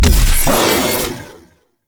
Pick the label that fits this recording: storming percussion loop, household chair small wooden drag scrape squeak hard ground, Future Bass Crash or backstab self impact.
backstab self impact